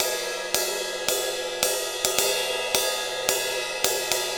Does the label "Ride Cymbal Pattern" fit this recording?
Yes